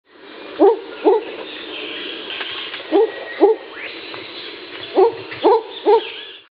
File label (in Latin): pulsatrix perspicillata